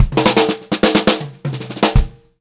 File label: FILL, Pop-Rock Drumloops; USB Soundscan vol.37; AKAI; 90 s Sample CDs; 120 SPEED